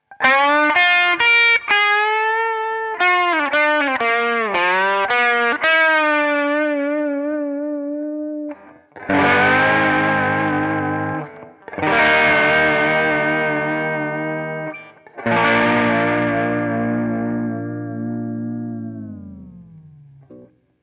Vintera II Tele Deluxe Tremolo

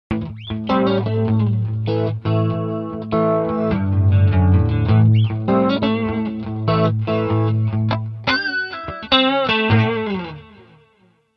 rhythm blues clean

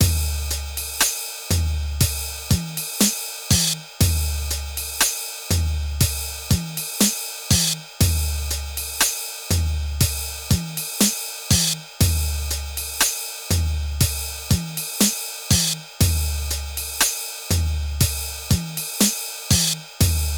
NTS-3 Original Drums

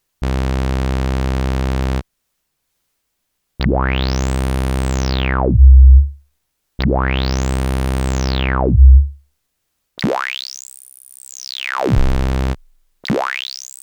LoFi 12XT Filter LP HP BP

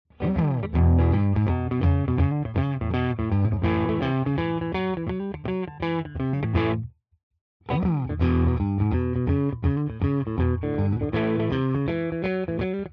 clean Riff all positions; Schecter Vintage PT Fastback II B

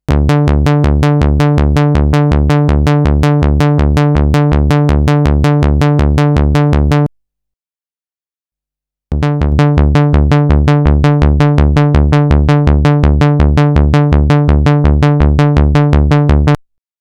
MonoPoly Sequence

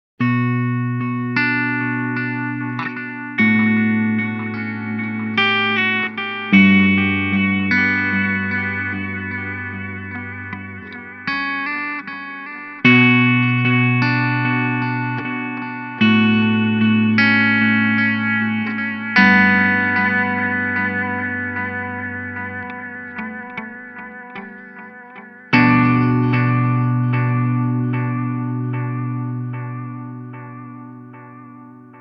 Boss ME-90 Natural Tape Delay

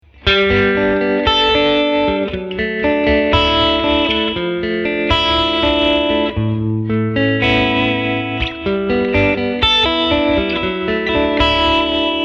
Nux Trident 89A Dirty Clean

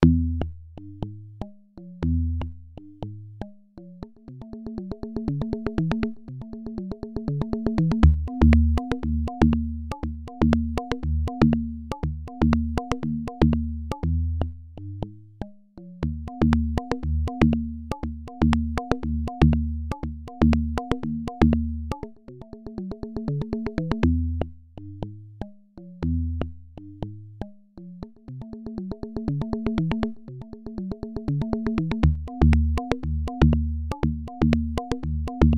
hand drums Muter

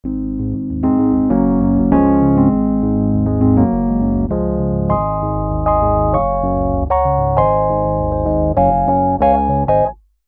Nord EP4 Rhodes MK5

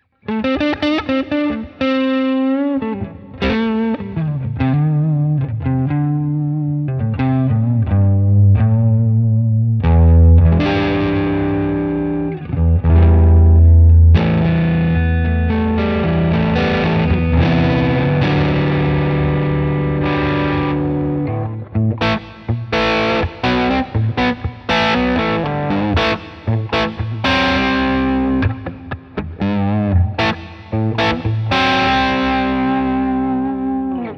Drive Boost Clean Amp; Headrush Software Update; Glorious

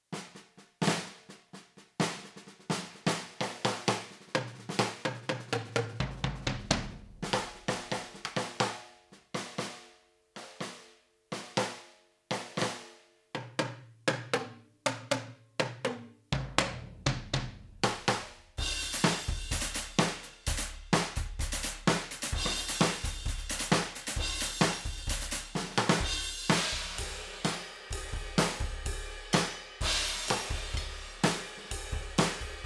Big and Mean Kit; ALESIS Strata Prime

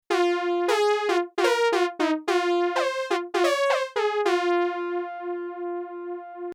Brass

